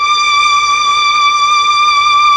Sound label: STR, M400; ILIO; Propeller Island Legendary